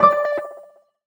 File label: tonal oneshot D; Pop; Slate; PASADENA Pop Sample Pack; Tonal One Shots